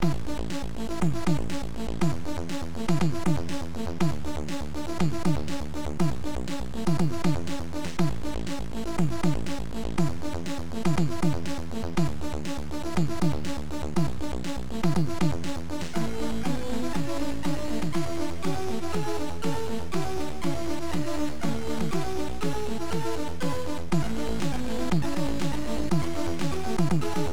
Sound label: 1 Bit, AY Digital